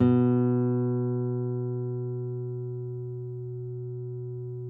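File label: nylon, FLAMENCO, Guitars; Spectrasonics; Hans Zimmer Guitars VOL-2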